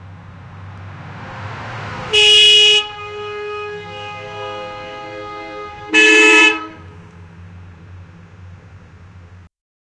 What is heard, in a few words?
a vintage automobile; 1940s